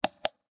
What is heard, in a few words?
Retry Jingle; Friday Night Funkin' Funk Space OST mod Windows gamerip 2023